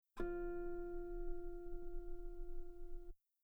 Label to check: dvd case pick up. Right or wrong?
Wrong